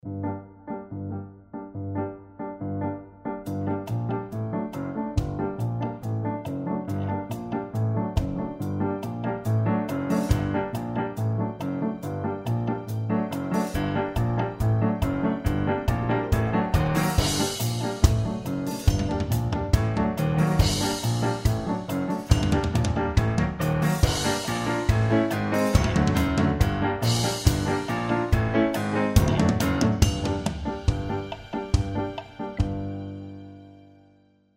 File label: piano; beetlejuice